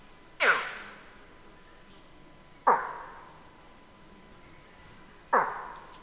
pyow hack (move) C. nictitans, Putty-nosed monkeys; vocalisations; compcog